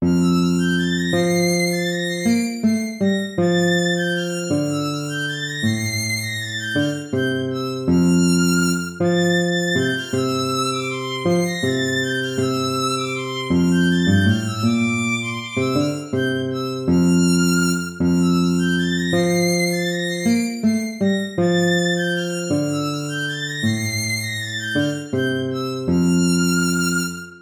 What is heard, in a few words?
lute